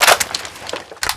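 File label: weapon foley tavor reload clip out; Call of Duty Modern Warfare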